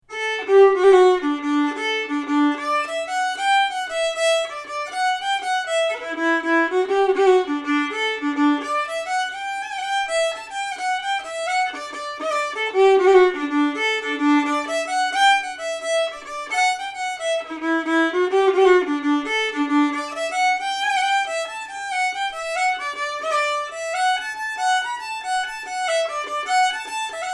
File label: fiddle; O'Reilly's Jig